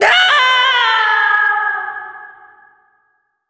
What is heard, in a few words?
falling, female; quake2; coop